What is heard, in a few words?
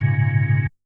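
organ stab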